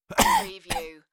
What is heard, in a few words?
Male Cough; beanstalk audio